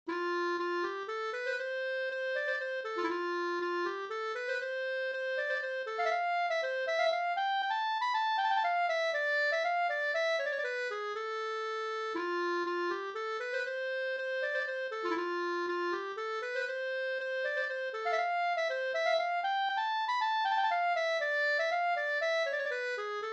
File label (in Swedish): polska Bodapolska